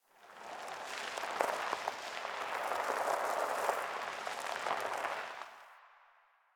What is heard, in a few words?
Tire On Cement One Off